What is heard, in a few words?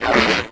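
droid attack; Star Wars Knights Of The Old Republic I; XBOX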